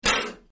Air Mortar Blast From Close Point of View, At 45 Pounds Of Pressure, X3